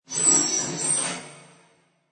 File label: Glass Reverse Movement; Scifi Production Elements, Set 1